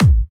kick

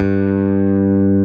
bass

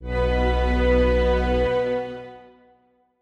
Strings

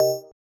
BELL

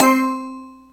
mallet C4; Isle Mallet

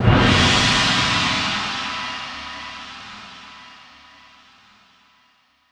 drum hit finish

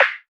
Snare Main Wet

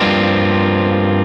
F#m7(11)

